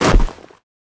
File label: land snow, footsteps; player